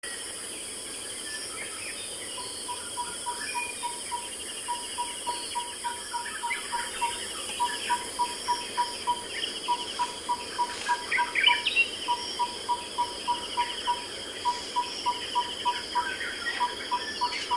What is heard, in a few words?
birds, Africa